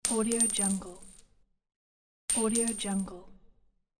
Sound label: coin drop